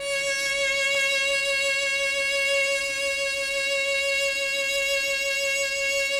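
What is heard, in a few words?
chamber cello; Sonic Reality; Vintage Timetraveler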